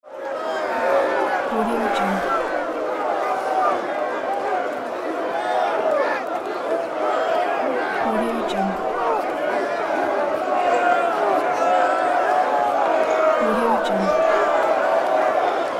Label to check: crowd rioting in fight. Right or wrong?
Right